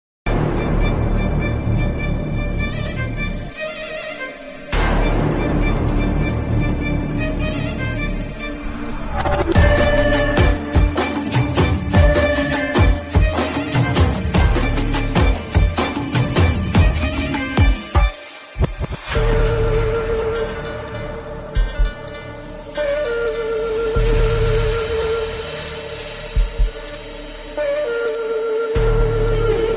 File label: naane varuven bgm ringtone